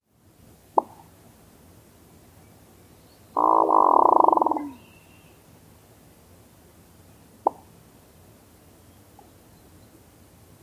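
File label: male pops and growls; NY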